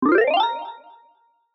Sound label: cute level up; UI